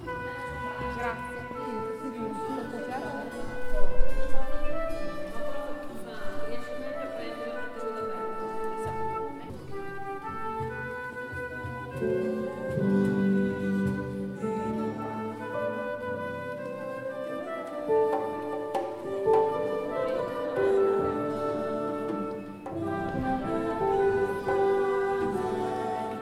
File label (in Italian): TEN flauto